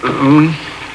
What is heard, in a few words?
Groan; thief